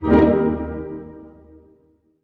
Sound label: HARP HITS D# MJ, Orchestra; Sound On Sound - Peter Siedleczek's Orchestra